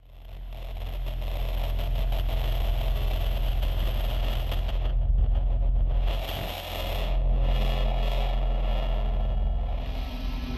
Static; Blastwave

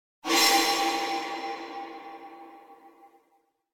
Impact Metallic Lower Squeaky Impact - Main; Hollywood Edge; sound designer's toolkit v2

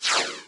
reverse; Quentin C's Gameroom

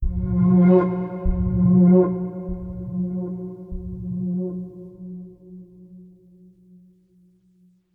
String Mystery; Inquisitor 3

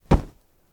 THUD Smooth; mono; Universal Sound FX; THUDS & THUMPS

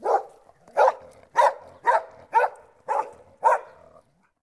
dog distant; DeadFright

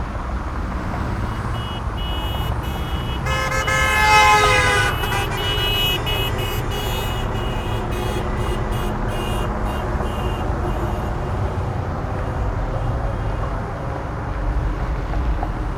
Urban traffic special event car horns; urban and suburban; boom library

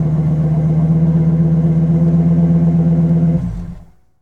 Truck Ford250 Idle Off; Trucks

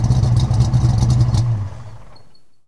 69 Mustang Idle Off, Automobiles

